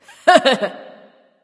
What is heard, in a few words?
hehheh; Smite; bellona